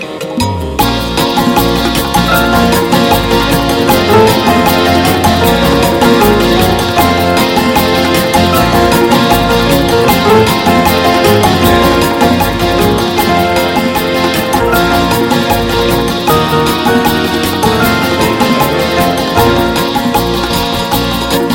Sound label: Beautiful Bamba